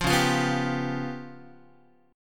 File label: Db 7th Flat 5th Guitar, Db7b5 chord; Db7b5 strummed; JGuitar